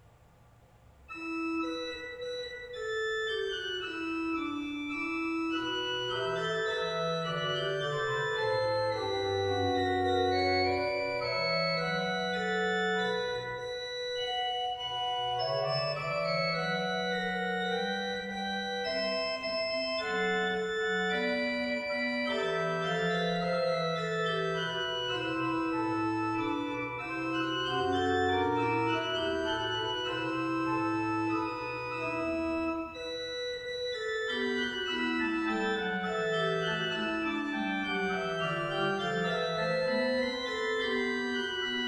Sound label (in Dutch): Inventie, orgels; Bovensmilde; Reuland